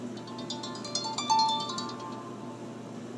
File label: harp ringtone; apple iphone 3g 4